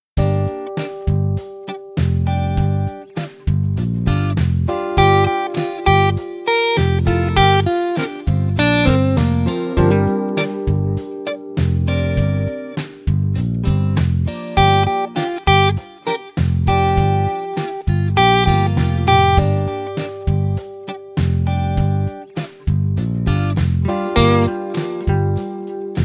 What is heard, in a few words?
G minor Improv, guitar